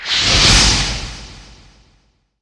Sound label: dragons fury impact, weapons; tf2classic